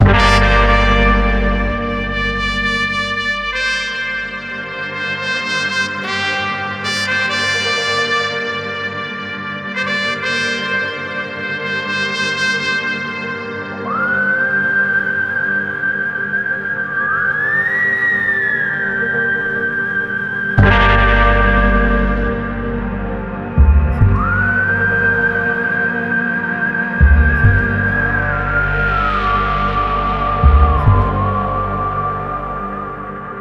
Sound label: Ambient Theme; Saints Row Original Soundtrack